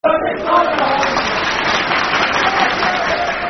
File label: Proud To Be A Buddie [Football Chant (Soccer Song)], St Mirren; St Mirren Smfc